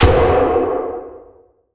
Crocodile, Industrial Hits; Antidote Advent

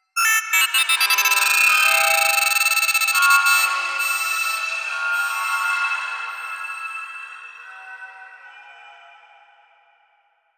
FX Ambient Fracture

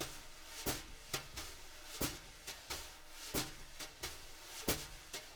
BR HT, BRUSH LOOPS; Sampleheads; Peter Erskine

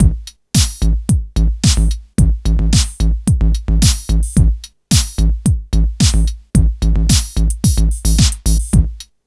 TECHNO; INIS - Funky Xtreams

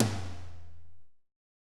KOMMERZDRUMS

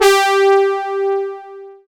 SYN, analog

